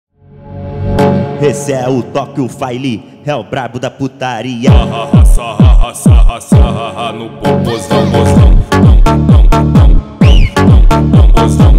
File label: montagem brazilian funk